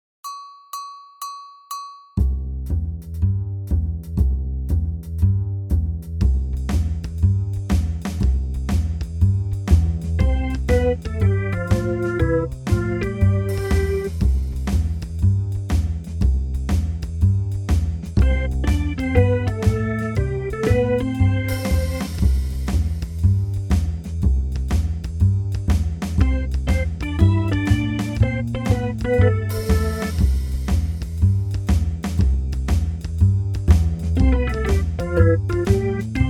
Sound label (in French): basson